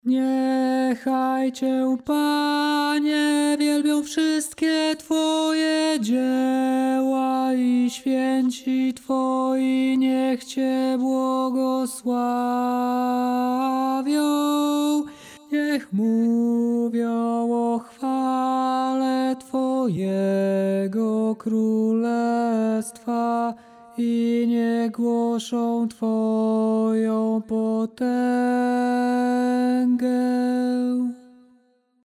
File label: tenor; Psalm 145